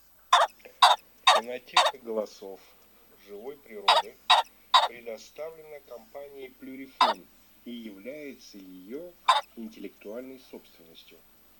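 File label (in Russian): Фазан петух Fagiano M, chip mini 12 для манка plurifon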